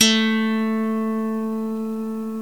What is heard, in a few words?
SWEDISH LY, Swedish Lyre